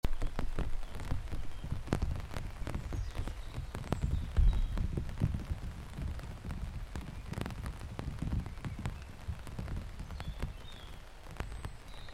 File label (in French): AMBIANCE PLUIE; college vallee du thore